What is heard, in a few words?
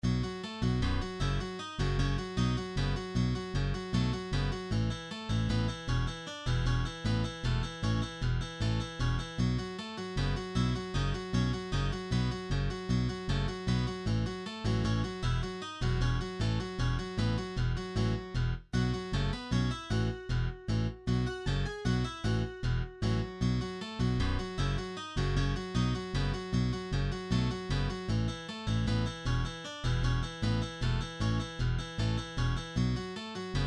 metal remix; Nintendo Tetris Music C